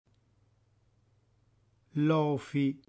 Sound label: lofi; reggiane; dialetto